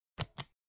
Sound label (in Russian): zvuk pechati shtampa